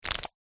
rubber band around rolled up news paper movement roll short; foley